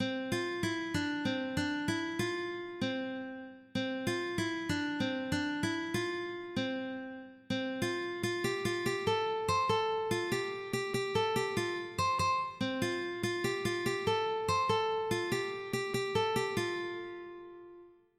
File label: ukulele